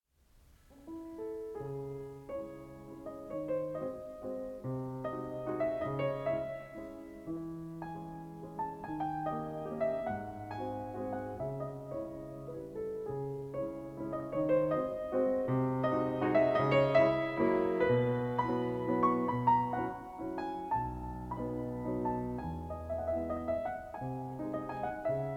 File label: Chopin Mazurka in C-sharp minor